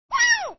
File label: yay